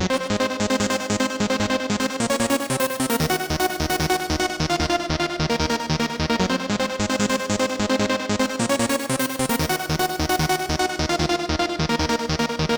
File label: Trance Express; abx3 trance presets vol2